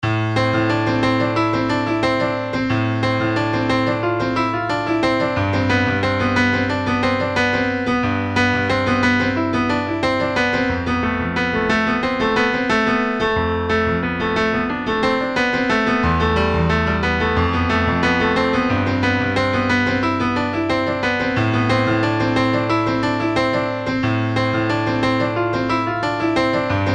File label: Piano